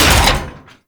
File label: Gatling Gun Dry Fire; Warframe; Tau Gatling Gun